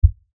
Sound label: LFE Hit, Lfe Hit Low Bass Impact; Blastwave; Sound Effects:Sound Design